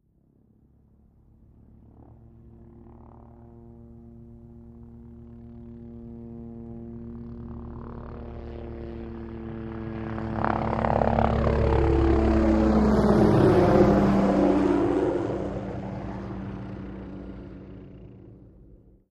OH6 Loach By Medium, little bird

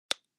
Light Switches Click

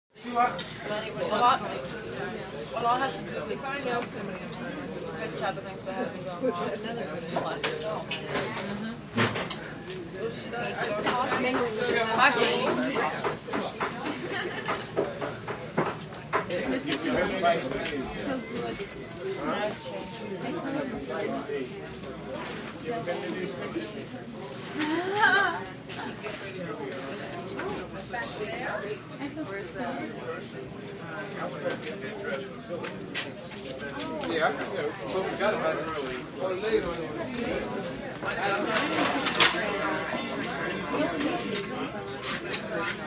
New York Tavern, bars & discos

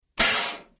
Air Mortar Blast From; fire extinguisher

